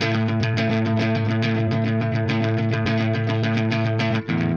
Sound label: Clean Funk Guitar